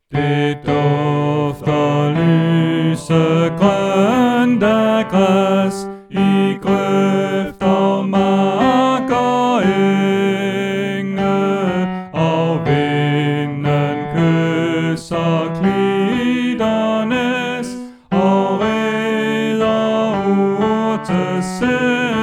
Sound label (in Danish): Tenor; oevefiler